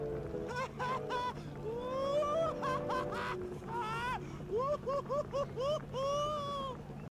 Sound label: sly haha hoho hihi